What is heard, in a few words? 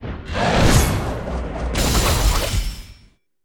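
demon attack, monster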